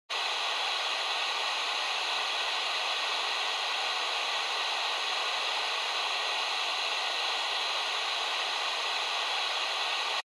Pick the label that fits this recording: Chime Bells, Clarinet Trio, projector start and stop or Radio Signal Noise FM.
Radio Signal Noise FM